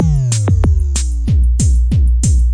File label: 188 bpm analog drum polka, DRUM LOOPS and BREAKS